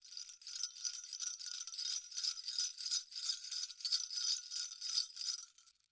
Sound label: Bell Sleigh Bells Shakes Short; Foley